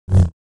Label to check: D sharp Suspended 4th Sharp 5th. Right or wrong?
Wrong